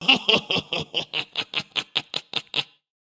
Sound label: sinester laugh